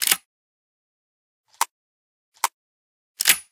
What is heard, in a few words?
reload, weapons; toz34